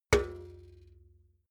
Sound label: metal bucket hit